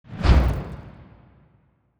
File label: expand horizontal in whoosh; biogenesis